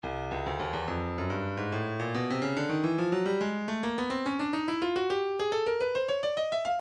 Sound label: breakbeat 071 bpm; Gangois; Stylus Breakbeat Midi Files